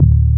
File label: WIGGL, Synth Bass, Roland